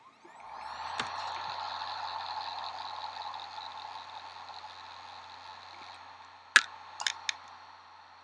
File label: hd sounds, laptop, bad; mac disasters